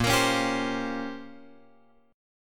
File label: A sharp Minor 6th Add 9th A sharp, Guitar chord; A#m6add9 Chord {6 X 8 6 8 8} strummed